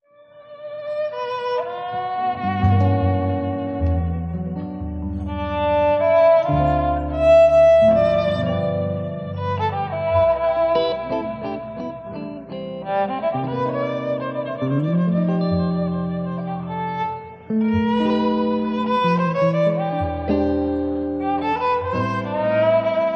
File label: Quintet; Ed Vokurka Quintet